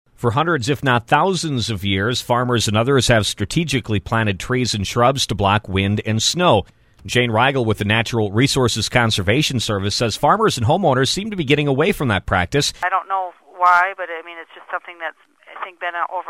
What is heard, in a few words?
break wind